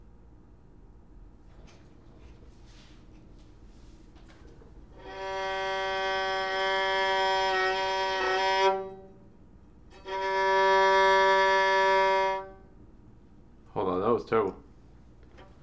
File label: Violin; radio 101